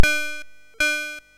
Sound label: BASIC, CSG8580R5